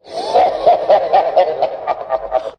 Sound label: laugh satan; ooh ahh